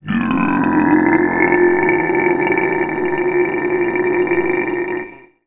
horror monster zombie male groan

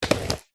rollerblade single roll step; foley

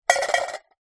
tin can lid inside empty tin shake; foley

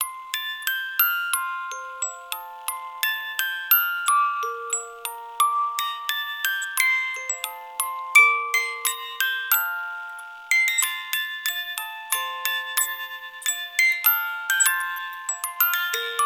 musical music box christmas jingle bells

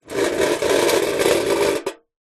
tin metal empty drag concrete; household; zapsplat

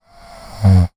human female snore single; zapsplat

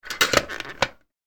game pirate figure push down into barrel; pop up pirate

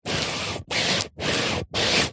sport soccer ball flat scrape concrete; zapsplat

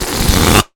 foley balloon air release fast close up; zapsplat

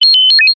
science fiction robot chirp vocalisation short

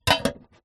tin metal small lid put on

